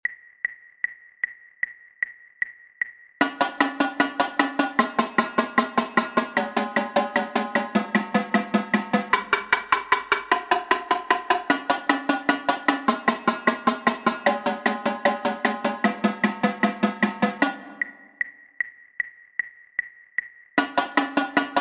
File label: tenor